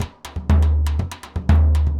SURDO, DRUMS SAMBA

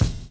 DRUM CHOP; AMG Gota Yashiki Groove Activator

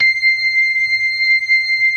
LOW MAN, LOWER MANUAL, Organ

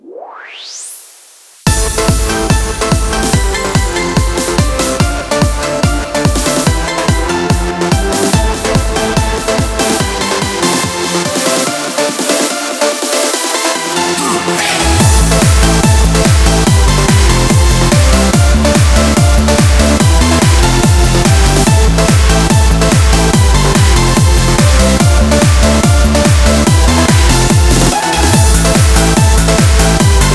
144 BPM Kit Complete Mix; Vengeance - Essential Clubsounds Vol.5; Demo Constr Kits; Flight Kit Root Amin

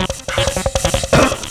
Arp w cough, Keyboards

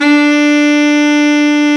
BRA BARI, Bariton Sax

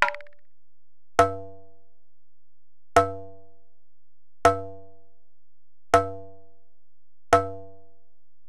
Alesis DM-PRO Ac Perc

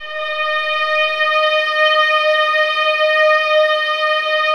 VIOLINS, Synclavier Strings; ILIO

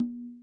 percussion, Millenium MPS-400

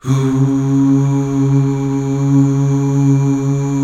UUH

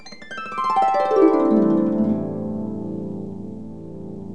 HARP GLISS

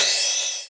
VINYL OH, Drums Dance & Groove; USB Soundscan vol.11; AKAI; VINYLKIT

